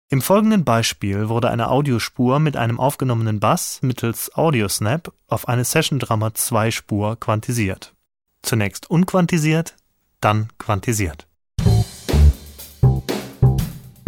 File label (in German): Audio Bass auf Audio Drums Quantisiert